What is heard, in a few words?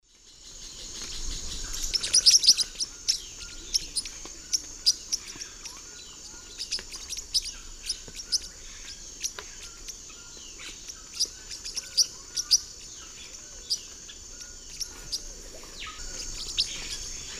Forpus xanthopterygius, calls; S. America; Eastern Lowlands